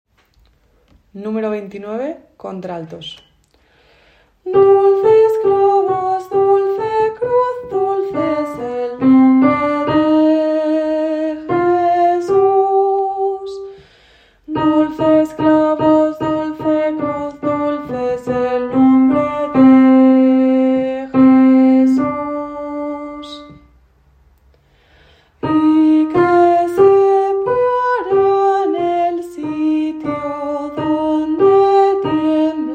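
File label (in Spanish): grito alto; Bodas de Sangre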